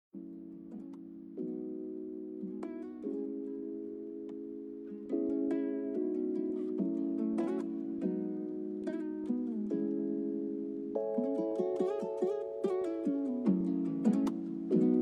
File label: Lofi Chillhop, Lofi Hip Hop Beatz, Sweet Calm